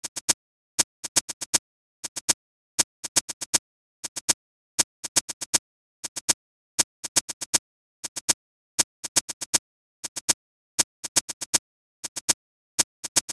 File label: Shaker